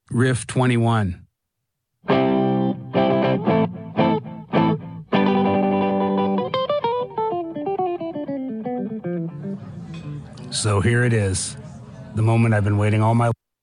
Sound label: Riff